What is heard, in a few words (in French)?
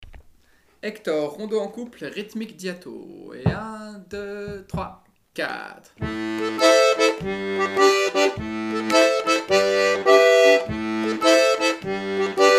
rythmique